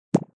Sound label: Blip; Beeps